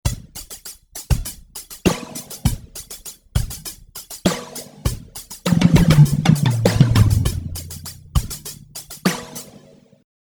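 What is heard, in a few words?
remix synthwave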